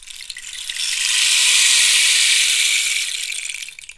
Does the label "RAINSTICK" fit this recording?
Yes